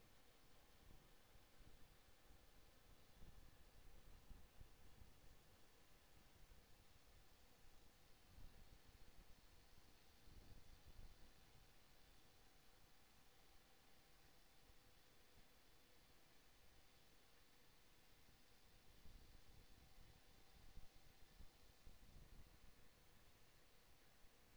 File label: HYDROPHONE 16000Hz; JASCO AMAR HYDROPHONE 740